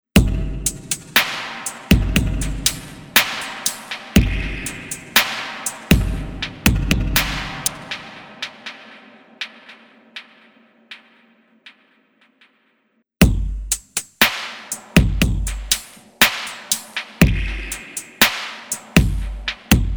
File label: Drum Loop Drums Down the Hall; Preset: Drums Down the Hall